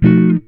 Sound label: GTR CHORD; Univers Sons; Jazzistic